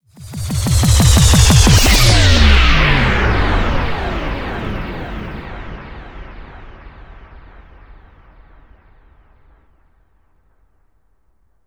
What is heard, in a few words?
Mission Failed; Max Anarchy; Anarchy Reigns